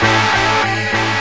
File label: ditty